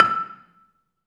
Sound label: BELA2 E5, BELAFON, Asian Traditions; USB Soundscan vol.60; AKAI